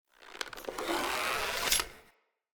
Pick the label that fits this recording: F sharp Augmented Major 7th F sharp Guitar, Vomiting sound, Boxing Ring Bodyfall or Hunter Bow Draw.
Hunter Bow Draw